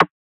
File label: Vintage Percussion Hit; Cymatics; Mystery Pack Vol 4